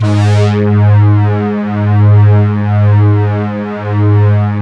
blower G#1; Best Service Alpha Dance vol.2; ATTACK

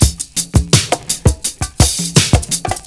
PERCS, 70 s Breakbeats

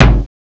MIL B DRUM; DRUMS; CMI Sound Library Rev 1.3

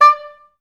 STAC D4, Orchestra; Best Service; Peter Siedlaczek's Advanced Orchestra

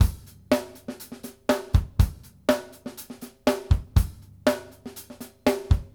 basic A, drumworks

